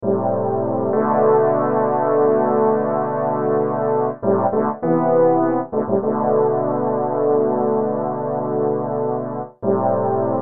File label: Big Horn